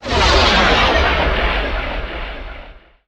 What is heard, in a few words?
summ tentacle